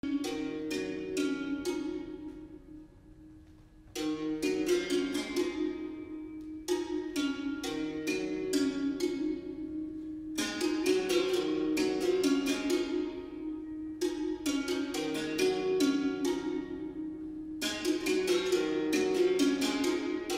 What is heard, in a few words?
berimbau duo; porteuse eau